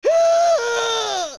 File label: Cursed Spider Scream; zelda; OOT